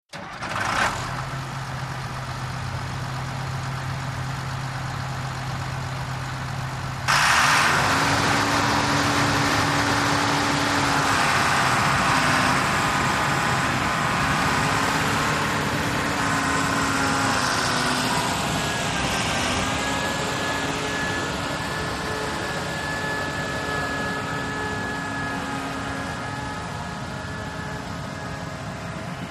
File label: VEHICLE - CONSTRUCTION JOHN DEERE 750: EXT: Start, idle, move away.; 750 Dozer